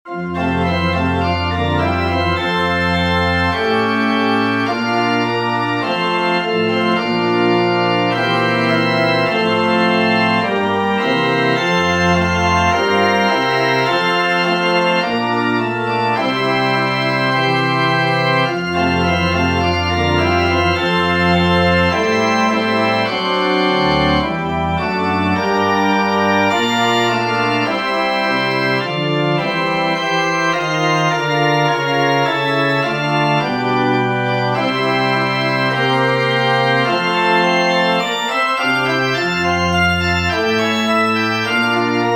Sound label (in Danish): nu tændes tusind julelys orgelstykke